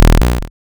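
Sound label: waveform FM sawtooth triangle envelope silence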